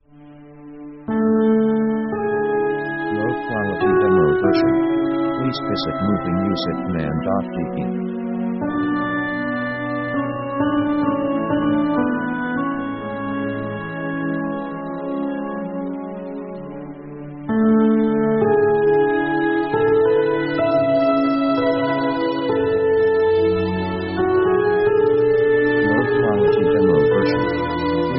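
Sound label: orchestral euphoric american slow; power themes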